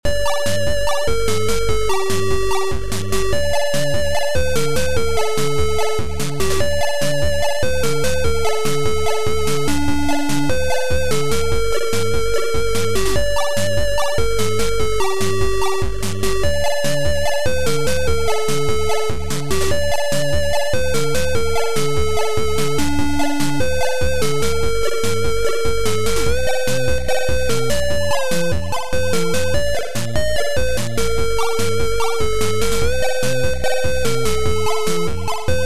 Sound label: Army song